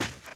grass, footsteps walk; boomer

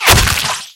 bullet impact, infected gore; npc, bullets

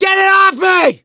scream while pounced; survivor; gambler

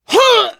hurt critical

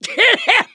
scream while pounced, mechanic, survivor

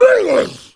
hunter stunned; pain

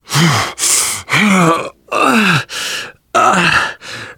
exertion critical, survivor voice biker

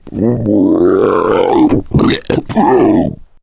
male boomer lurk

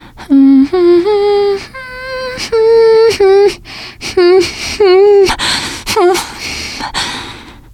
nervous humming, teen girl; player; survivor; voice

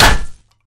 bat impact world, weapons

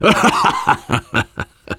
laughter, survivor voice biker

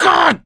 choke; survivor

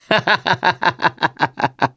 laughter, mechanic, survivor

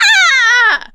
witch pain; npc, voice

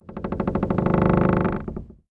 wood floor squeak

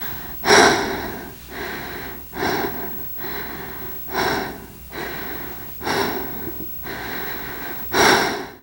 exertion minor, teen girl; player; survivor; voice

